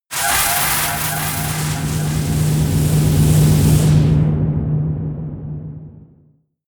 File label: air screaming vortex; laerm